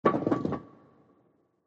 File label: pin